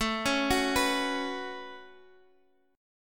A 6th Add 9th, Guitar Open F, chord; A6add9 arpeggiated; x,x,9,8,9,6

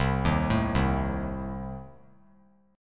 C Minor 6th, chord; Cm6 arpeggiated; JGuitar

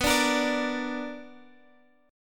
B-Minor Major 7th B down Guitar Standard; strummed; capo=2

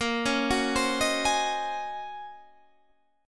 D sharp Minor 13th A sharp, chord

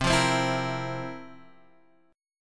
F Major 7th Suspended 4th C

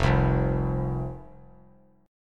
F 5th F, down, chord; F5 strummed

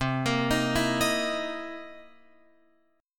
C 7th Sharp 9th C Guitar Open F; C7#9 arpeggiated

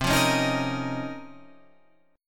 C sharp Major 13th, chord

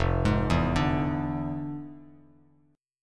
F Minor Major 13th, Bass Standard; arpeggiated; JGuitar

